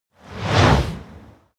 whoosh

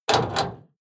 lock metal door

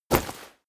step leather light

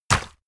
horse step water shallow

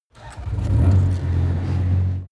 mini tractor start

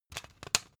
cd take out

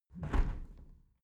window close regular

